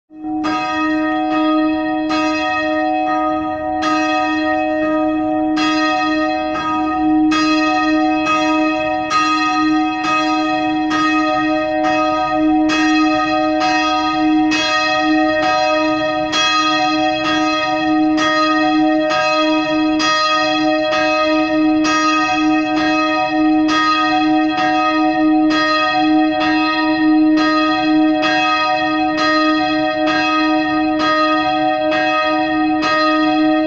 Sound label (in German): Kiche 2 Glocken; Voigdehagen